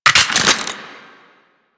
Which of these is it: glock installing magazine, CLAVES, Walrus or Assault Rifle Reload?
Assault Rifle Reload